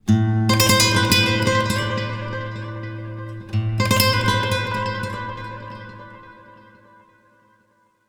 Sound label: Oneshot Acoustic Guitar